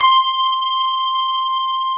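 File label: grand piano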